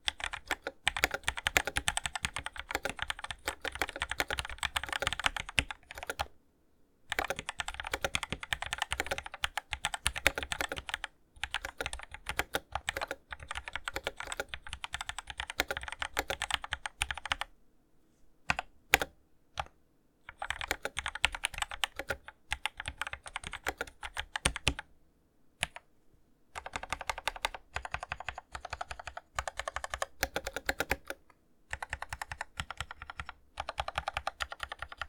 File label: Keyboard Typing Sounds Unidentified Technics Keyboard